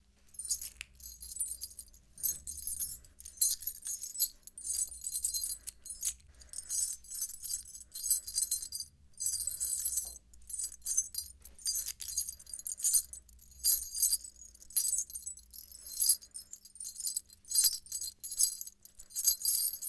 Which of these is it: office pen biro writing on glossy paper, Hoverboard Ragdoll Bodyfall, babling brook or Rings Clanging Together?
Rings Clanging Together